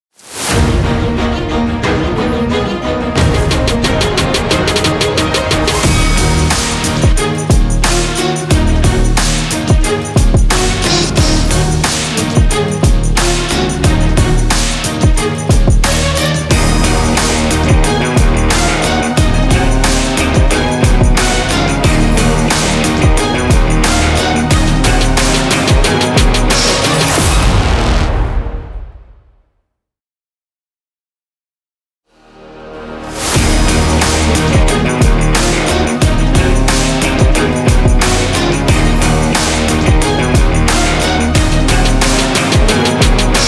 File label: motivational sport intro logo